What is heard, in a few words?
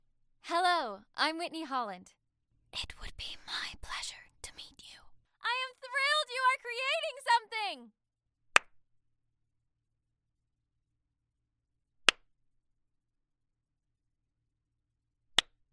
raw studio sample